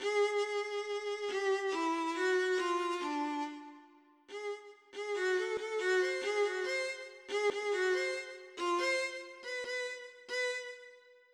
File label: midi; Symbolic Composer 4.2